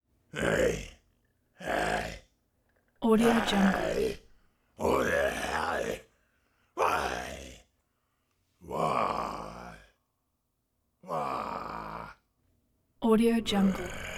creature voice angry growls upset disturbed

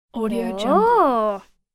kid gentle admiration voice

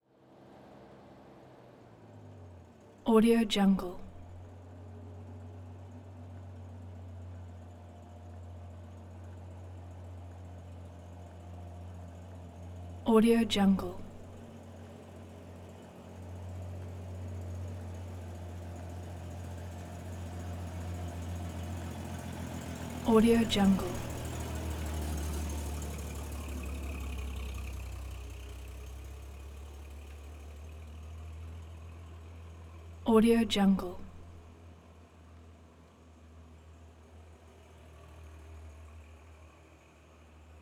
1973 toyota land cruiser fj55 creeping pass by

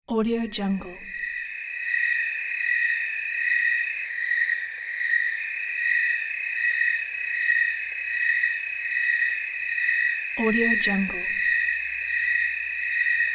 night mountain ambience

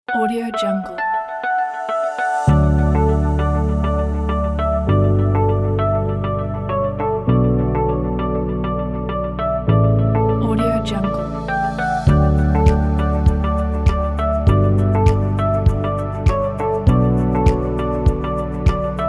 minimal corporate; audiosteals

